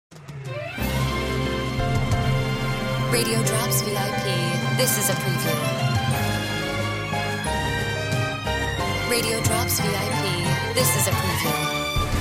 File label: Music Bed